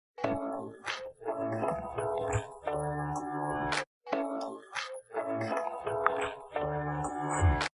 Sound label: ad music; amazon